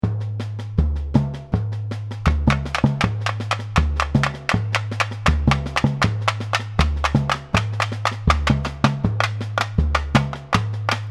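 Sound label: tamborim break, samba; Pirate Samba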